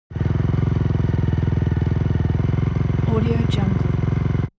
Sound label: transportation motorcycle motorcross idle hot engine